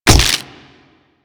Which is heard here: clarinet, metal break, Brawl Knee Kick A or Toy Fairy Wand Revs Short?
Brawl Knee Kick A